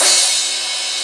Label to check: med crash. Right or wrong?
Right